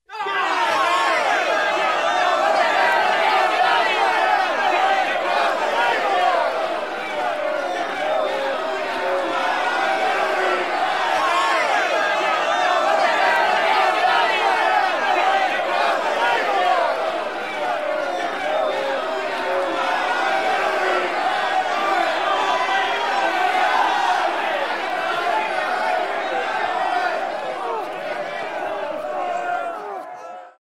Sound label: angry mod sounds